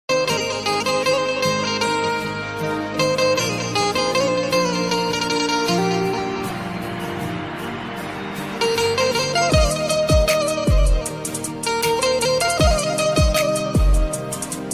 banjo ringtone, love song ringtone

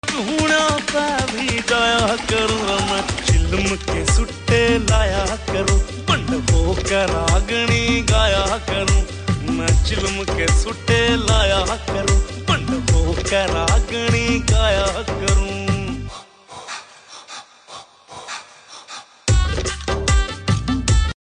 haryanvi song

